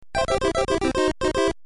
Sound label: Balloon Fight Rebirth Sound Effect